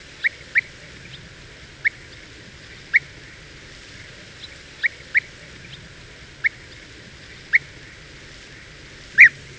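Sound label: call, bee-eater, calls uttered when perched, some calls might be a mild alarm signal.; merops apiaster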